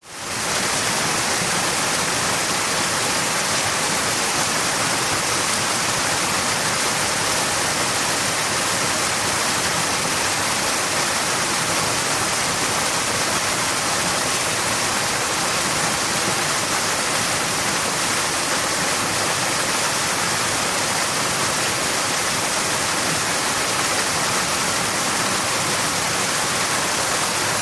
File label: Waterfall